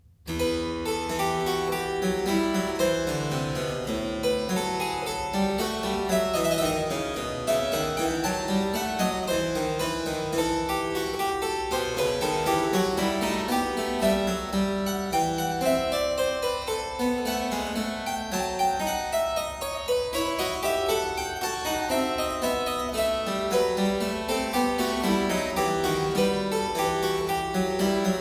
Zweistimmige Inventionen in F minor, Keyboard Works; BWV 780; J.S. Bach; Inventionen & Sinfonias